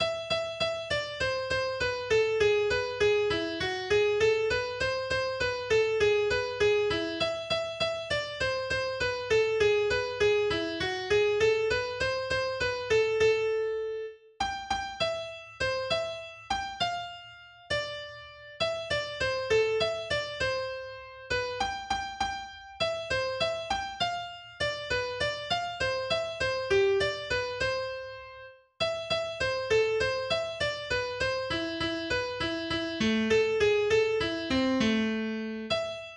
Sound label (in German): Tarantella Solo AkGit; Giuliani